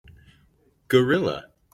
gorilla

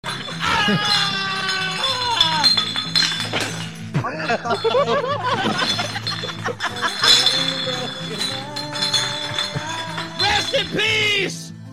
bottles

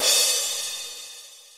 crash

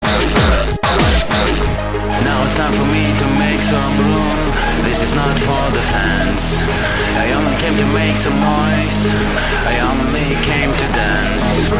intruder alert

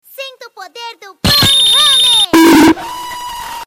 ban hammer bonk

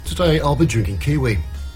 drinking

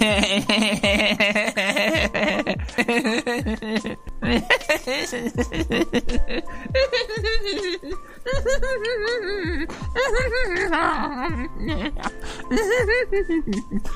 hehehe

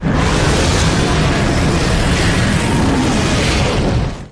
unknown roar

